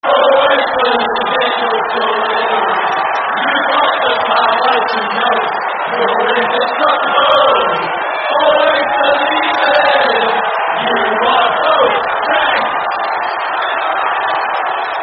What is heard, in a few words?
No Cheer; Middlesbrough; Boateng; terrace chants